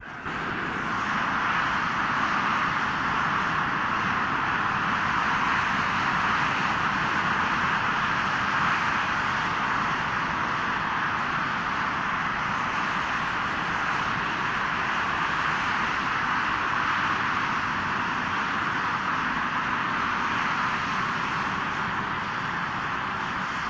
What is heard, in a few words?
Noise